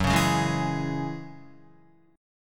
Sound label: Gb 7th Suspended 2nd Gb down Guitar; strummed; JGuitar